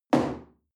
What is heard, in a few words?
chair wood place hard